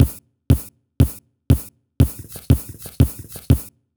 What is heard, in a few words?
percussion putts